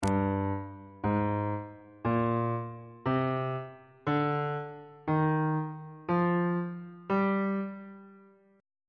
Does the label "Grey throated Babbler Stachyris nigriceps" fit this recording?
No